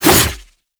Sickle Whoosh; Warframe; Scaldra Butcher Sickle

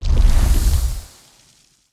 Healing Totem Cast; Fey; Ward

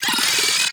Arachnoid Micro Vocal Attack; Warframe

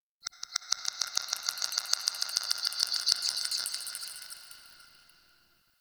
Sentient Texture Small J; Warframe; Sentient Lair

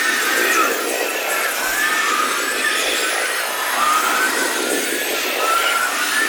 Prayer Circle Loop; Warframe; Tau; Roathe

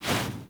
Generic Quick Foley; Warframe; Characters

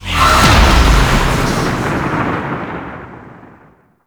Cet Invasion Offering Paused Generating; Warframe; Cetus

